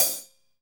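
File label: HH, hi-hat; Sound & Vision - Gigapack I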